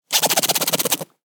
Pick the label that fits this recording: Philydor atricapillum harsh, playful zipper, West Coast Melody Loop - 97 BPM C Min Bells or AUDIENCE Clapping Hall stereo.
playful zipper